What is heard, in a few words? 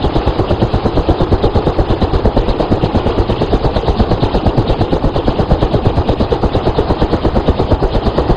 Rotor UH60; DCS